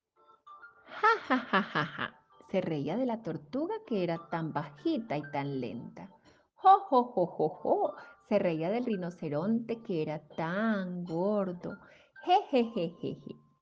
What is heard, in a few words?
jajaja